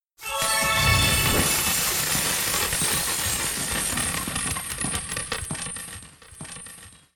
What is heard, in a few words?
sync sound; wbg games stable; progressive jackpot coins